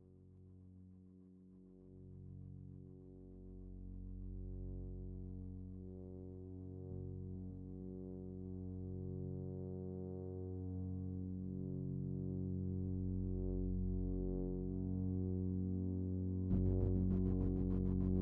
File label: Deep Coma Super Smooth Space Engine Remix